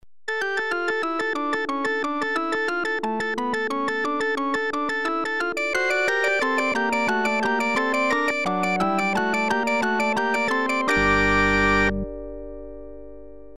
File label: Percussive Organ; Zephyrus; Syntheway